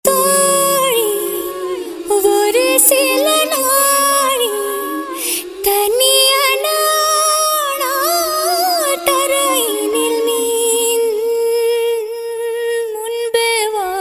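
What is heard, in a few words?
background music ringtone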